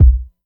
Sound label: kick single hit b key